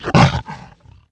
attack

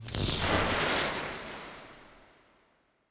ice lance cast

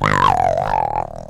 die; mahlendur

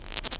WALK

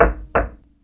lever locked

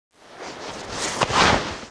BACKPACK HANDLE

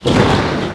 attack

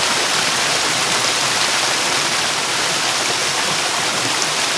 waterfalls

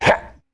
orc ambient short

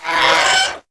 HURT; mahlendur

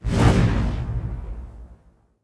FIREBALL CAST

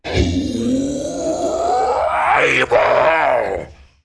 ORC HAPPY

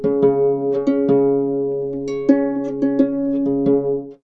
LUTE

